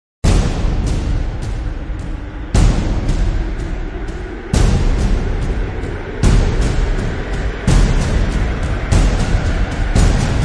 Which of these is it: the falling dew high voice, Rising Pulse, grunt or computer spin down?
Rising Pulse